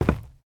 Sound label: zombie footstep wood; DeadFright